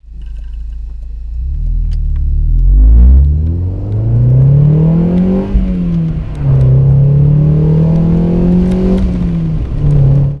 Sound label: fm accelerate